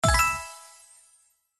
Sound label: Win animation, slots; Higher paying symbol (non-letter symbols); Sugar Town